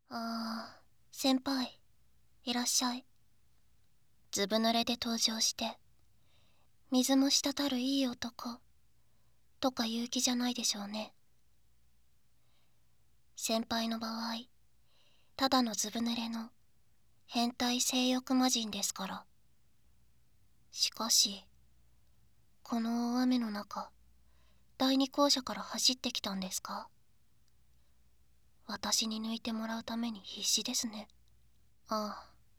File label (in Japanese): フェラ 射精音なし; フェラショート